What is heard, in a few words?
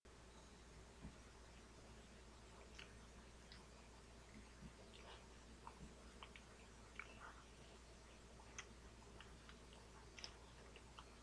rain